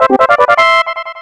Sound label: item get; mother2